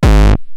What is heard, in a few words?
Roland TR-909 BD; BD Selection